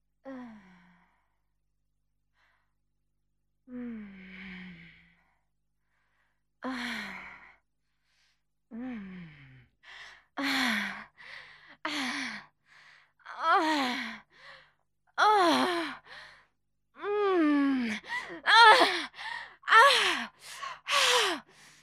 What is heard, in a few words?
female orgasm